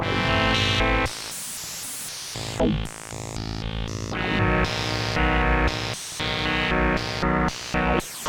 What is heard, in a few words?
B pulse 114